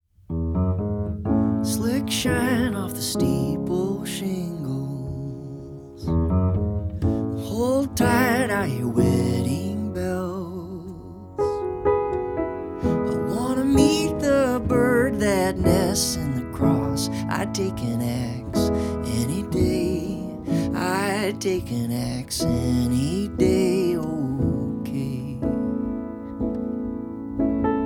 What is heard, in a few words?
Wedding Bells